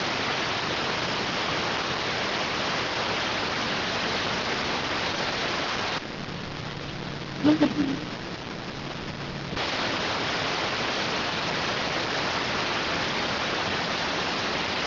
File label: rtty rx